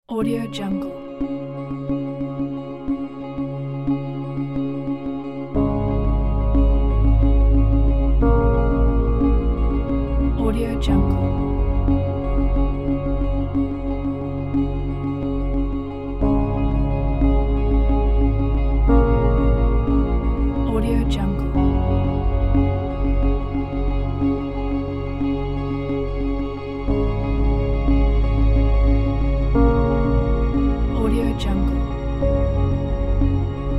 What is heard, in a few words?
documentary piano ambient background; manriquedelara